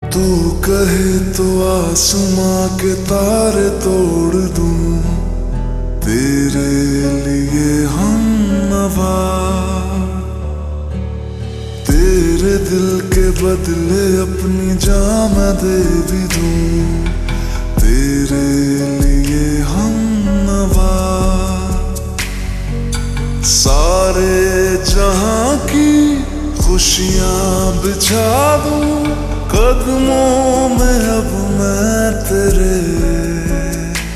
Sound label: Ringtone Slowed Reverb